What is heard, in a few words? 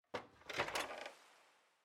Opening window